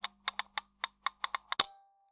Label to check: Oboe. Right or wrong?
Wrong